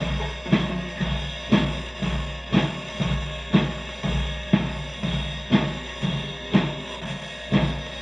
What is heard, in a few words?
Chamberlin Rhythmate Loop, Drum Machines